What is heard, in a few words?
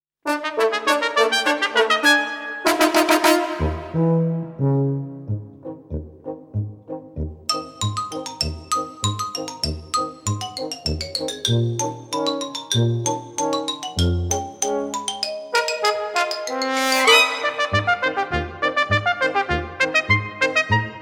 Polka